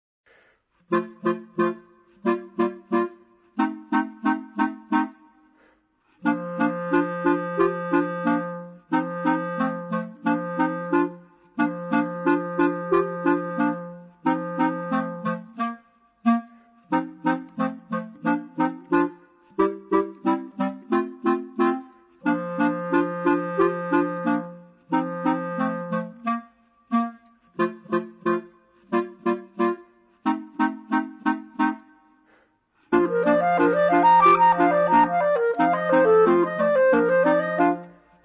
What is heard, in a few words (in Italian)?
polka, clarinetti; insieme per clarinetti